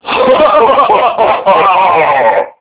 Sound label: madlaugh; human